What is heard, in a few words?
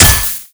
ubersaw hit, weapons; tf2classic